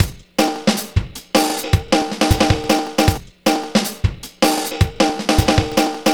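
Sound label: Flutes & Swingers Drums; Zero-G Kocktail Kollection; Construction Kitz (Lounge); Flutes & Swingers 156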